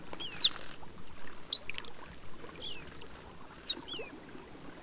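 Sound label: ortolan bunting; bayankhongorijn-khukh-nuur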